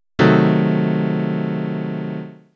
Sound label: Cdim7 chord, piano